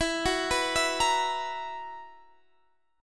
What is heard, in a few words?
B Major 7th Suspended 4th, guitar chord